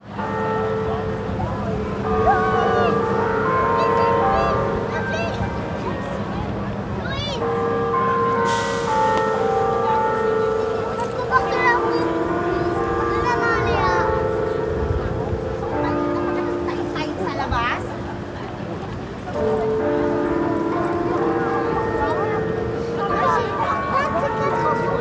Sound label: AMBIENCE CITY SPAIN BARCELONA EXT DAY SAGRADA FAMILIA CHURCH BELLS MUSIC; Monte Sound; Barcelona City Ambiences